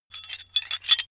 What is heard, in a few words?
metal handling pieces